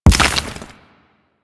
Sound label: Mech Step Surface Dirt; Step Surfaces; Nechro